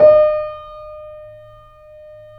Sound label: C7 PP, The Ultimate Piano Collection; East West